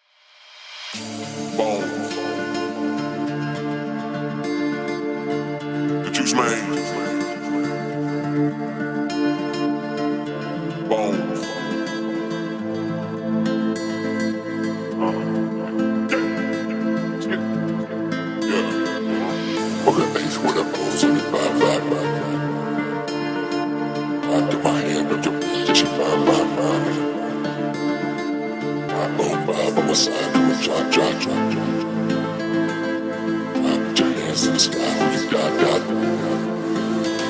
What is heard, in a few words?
deep house remix